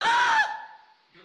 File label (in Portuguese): grito